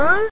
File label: UGH; Sexy Games 3